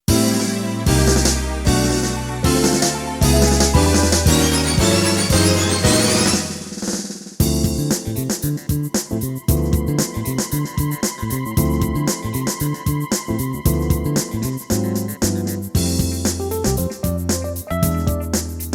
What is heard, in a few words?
CASIO CDP 230R Demo Song